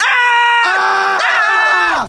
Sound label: scream two guys; ooh ahh